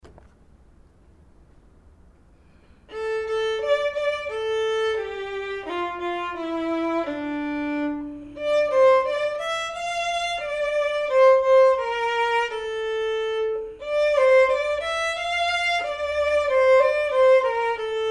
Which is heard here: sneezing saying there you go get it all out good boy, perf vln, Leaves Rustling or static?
perf vln